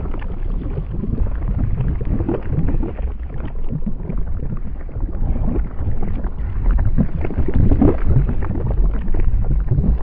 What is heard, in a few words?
molten metal